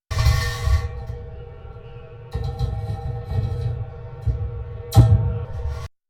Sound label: Beats; MD Instalation K 2; HomeRec Mix